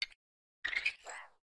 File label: spin, copper golem, oxidized; Minecraft